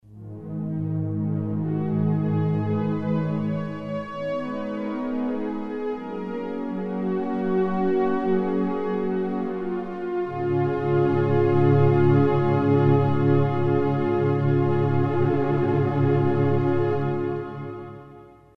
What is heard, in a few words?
Soft Strings; Kris Rhen Presets